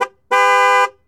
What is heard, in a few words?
Truck Explorer Horn; Trucks